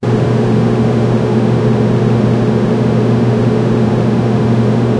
soft background murmur of; light breeze

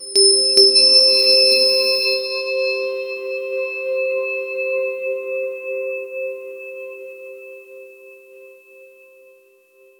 soft notification sound

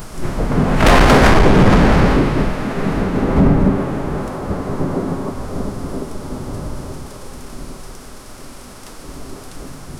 intense thunderstorm with; weather

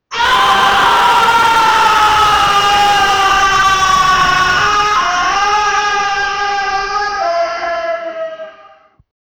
suffering and pain scream

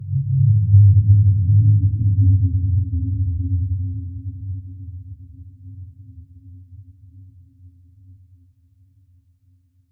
swamp ambient sound

